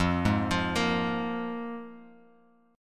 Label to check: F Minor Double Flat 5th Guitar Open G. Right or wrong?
Right